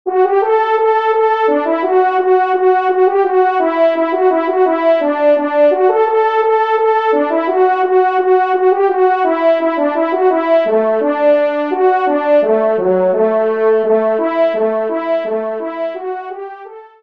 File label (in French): Polka, Valse, trompe; Polka des Charbonniers et Valse par David; Auguste Opportun (Polka) David (Valse)